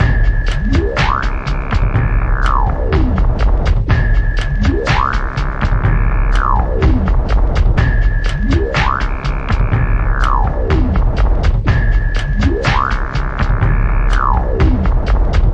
sonar beat bass talk, drum loops and breaks, 062 bpm; 000 to 080 bpm